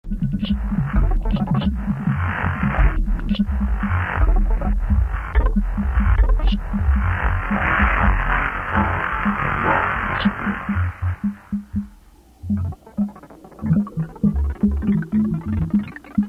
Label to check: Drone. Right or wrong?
Right